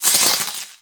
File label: Sword Elemental Electricity Whoosh